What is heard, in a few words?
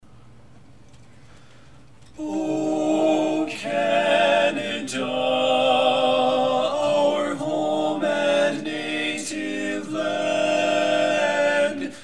tenor dominant; o canada